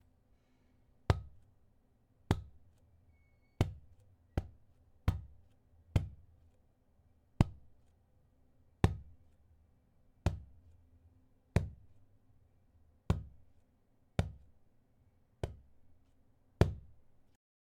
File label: Bassy Impact